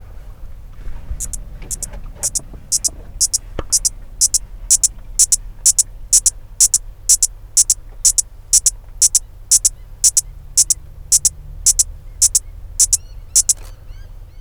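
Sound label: Australia Queensland Gudanga adamsi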